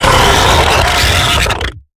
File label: Harpooned; Lurker; Evolve; Gorgon